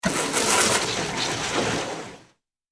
Hard Water Spray, hose

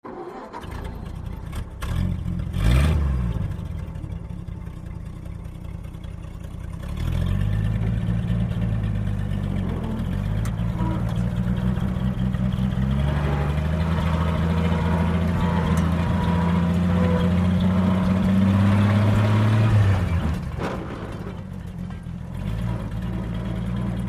1936 Ford Interior, old v-8; ford truck old v-8

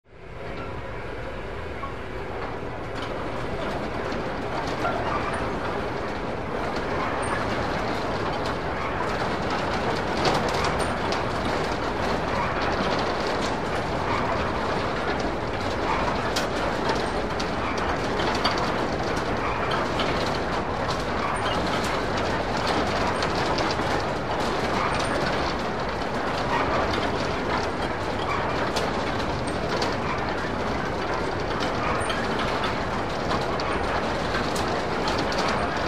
Ride; Carousel Operates With Metallic

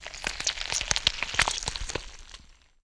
Corn Being Twisted; gore stabs & eat

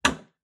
hammer hit

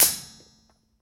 Shell Drop On Metal S, shell casings fall

